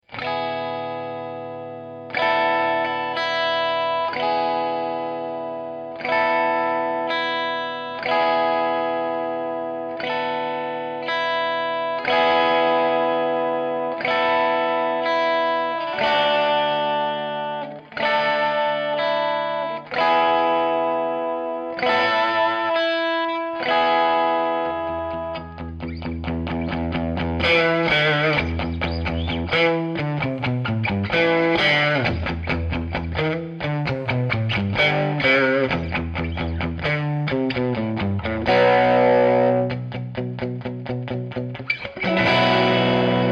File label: sparks Bit